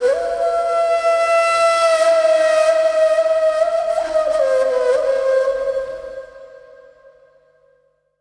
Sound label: SIERRA FLU; Best Service Advanced Media Trax Building Blocks